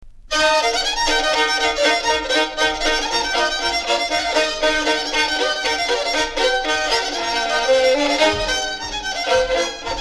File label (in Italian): roncastalda a due violini